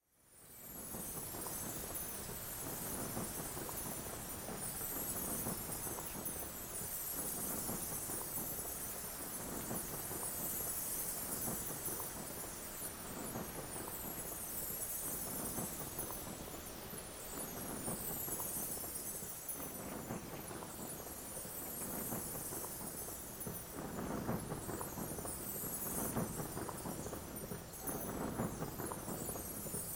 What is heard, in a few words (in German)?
Geraeusch- Jesus erscheint Paulus